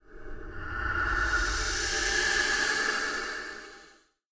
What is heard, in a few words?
ghost